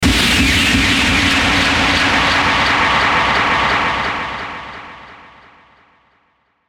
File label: Hit Fuzz Reverb Delay; Blastwave